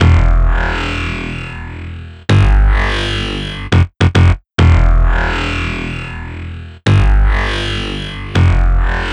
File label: Bassline; Drums & Drones